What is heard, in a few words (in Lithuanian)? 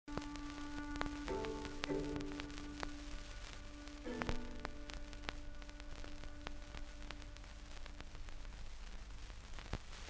kvartetas; Juzeliūnas; Kulikauskas